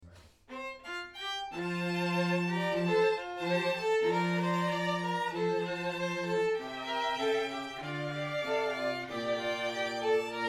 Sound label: Chorale Excerpt; St. Anthony